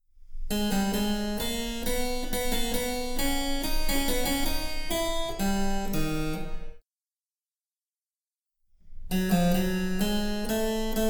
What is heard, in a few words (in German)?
Cembalo; Mark Lindley WTC 1